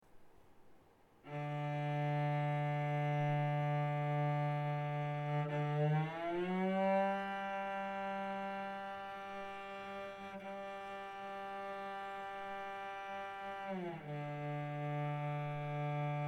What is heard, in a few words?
Perfect 4th